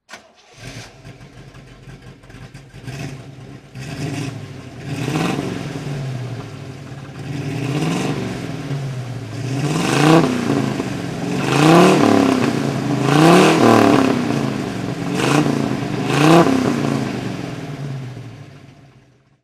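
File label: corvette throttle, engine sound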